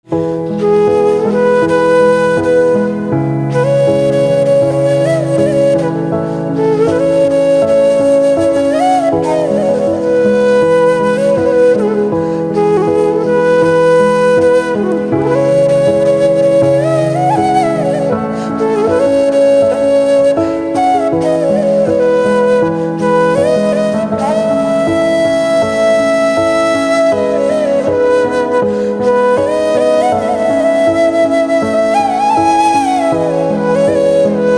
Flute

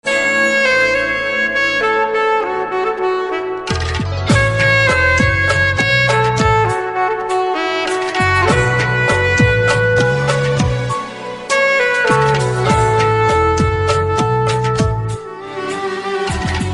Saxophone

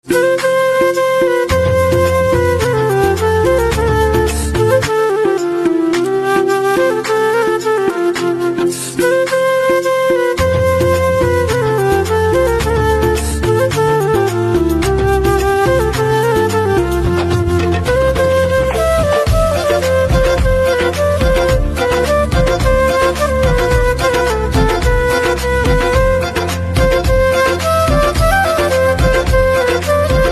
Flute

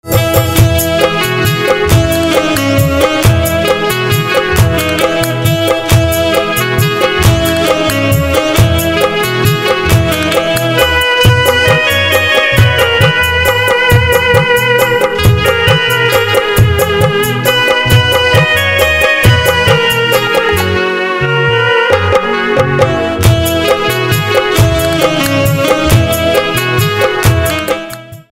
Saxophone

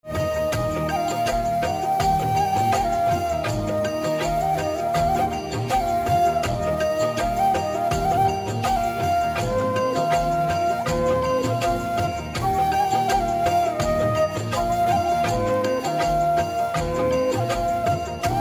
Flute

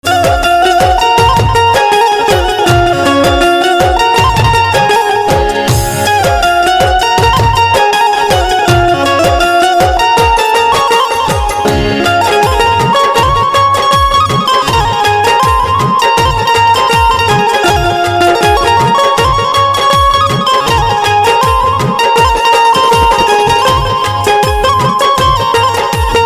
Banjo

